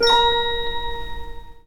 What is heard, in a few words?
tinkly, Synths; Notes